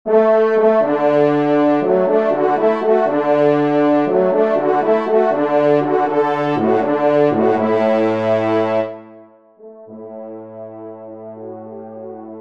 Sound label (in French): trompe; Dans la Foret